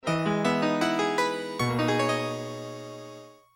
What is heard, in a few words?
sleep; Breath of Fire